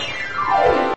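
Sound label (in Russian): пианино эффект скольжения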